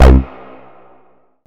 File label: 303 Acid C; Vengeance Samples